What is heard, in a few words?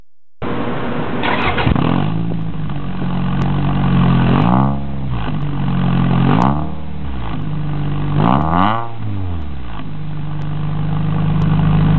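3SGE with Silencer, muffler, TOYOTA CURREN; 柿本改 FULLMEGA SINGLE(ST202用) サイレンサー有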